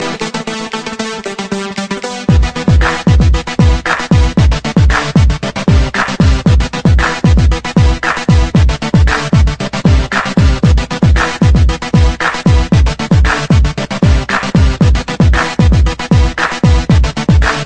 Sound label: Mega Bass